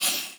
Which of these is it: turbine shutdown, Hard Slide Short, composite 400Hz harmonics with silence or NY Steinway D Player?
Hard Slide Short